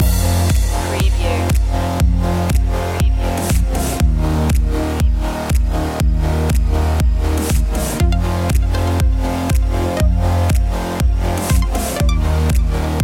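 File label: Electronica Electropop underscore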